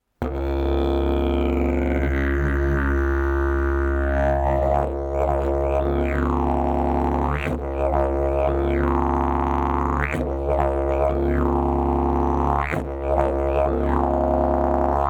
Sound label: didgeridoo; jilm trouba